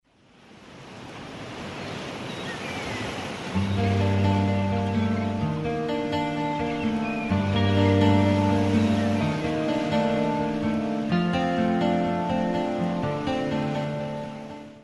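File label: sounds of the sea and music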